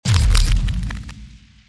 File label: boom